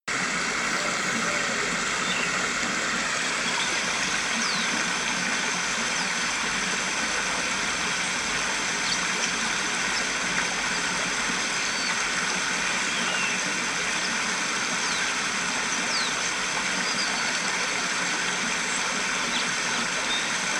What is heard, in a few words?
aviary